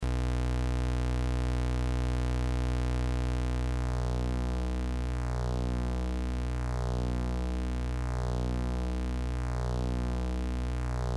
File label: Serge FS Pseudo PWM; Frequency Shifter; SergeModular; Frequency Shifter Samples